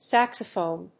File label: saxophone